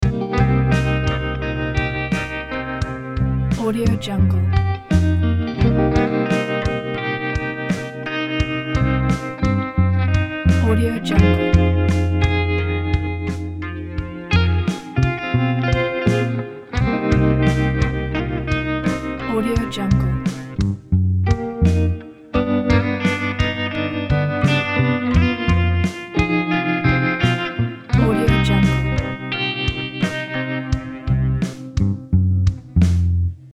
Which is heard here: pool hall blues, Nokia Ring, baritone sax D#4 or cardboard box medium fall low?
pool hall blues